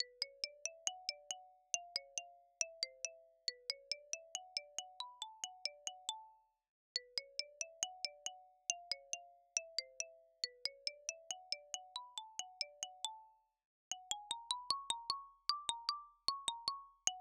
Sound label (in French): percussion